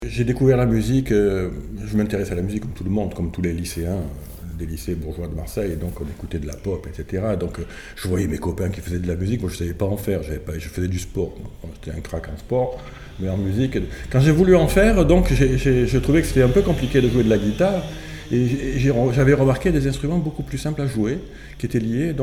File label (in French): Dulcimere